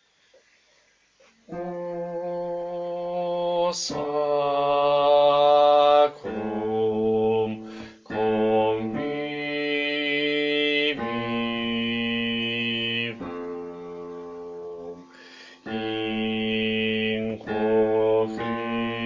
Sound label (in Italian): bassi, coro; Coro Isola del Giglio